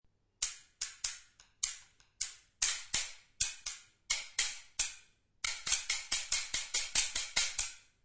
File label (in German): holzbesen